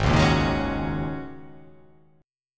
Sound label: Ab 9th Suspended 4th Ab down; Ab9sus4 strummed; JGuitar